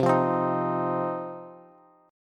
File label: C Minor Double Flat 5th C down Bass; Cmbb5 strummed; JGuitar